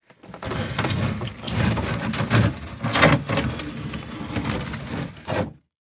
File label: Household Gate Wooden Gate Scrape Drag